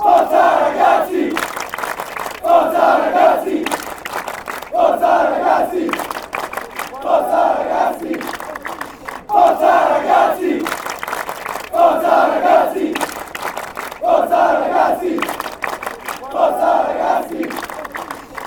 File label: boys, fanchants